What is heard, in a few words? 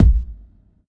rich low end kickdrum g sharp key